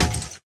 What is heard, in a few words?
car glass impact; factorio 2.0.55